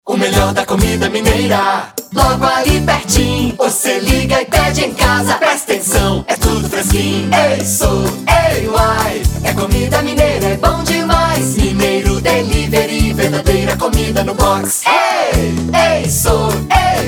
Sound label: Jingle; Mineiro Delivery